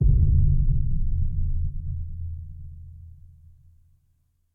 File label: boom; Level4 Hidden World